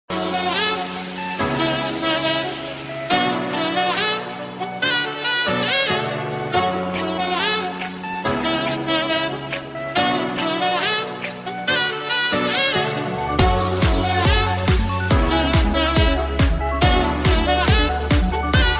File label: saxophone la la la ringtone